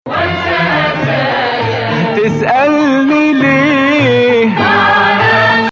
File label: Nawa Bayati